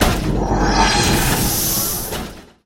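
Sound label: endings outro, disappearing fading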